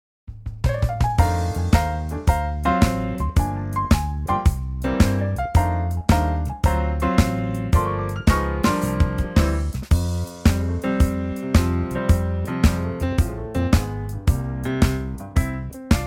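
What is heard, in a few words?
F Trio, backing track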